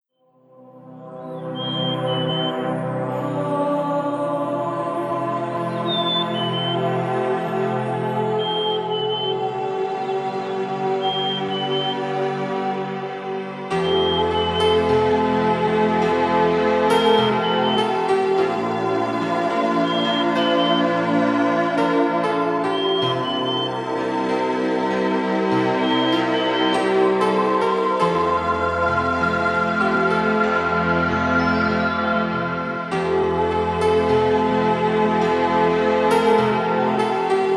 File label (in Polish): muzyczny miks relaksacyjny 432 Hz